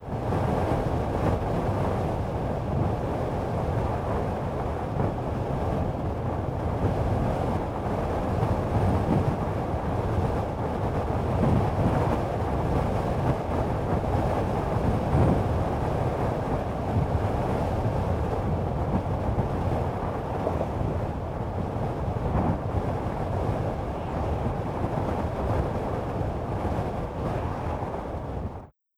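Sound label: Perito Moreno glacier Air movement; Pedromorenoglacier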